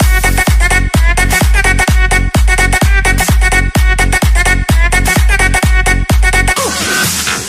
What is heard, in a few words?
The Techno Beat